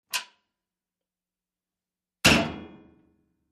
Wash Mach Lid Open Fir; washing machine

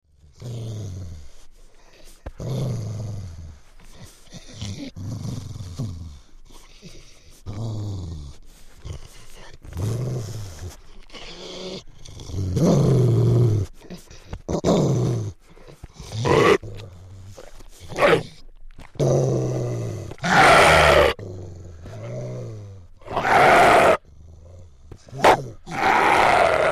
Rottweiler Vicious

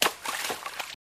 FS Stream, singles water

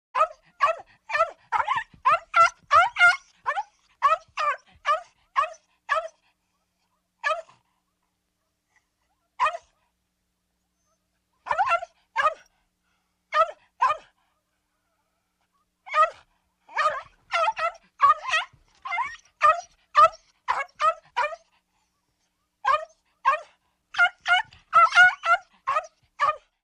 Dog Small Yelps Barks

